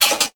Rotary phone dialing sound effect Button

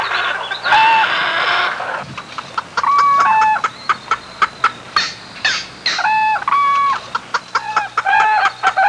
Aviary sound

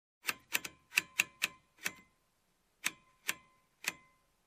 Typewriter Key like sound

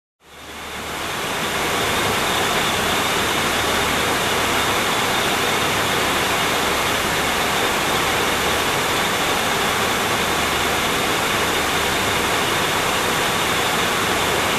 Rice Miller running

